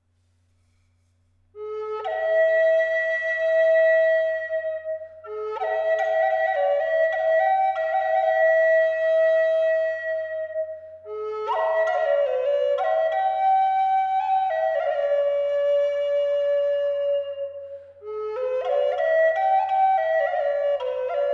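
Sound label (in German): Eastern Red Cedar 6 Loch A hoch 432 Hz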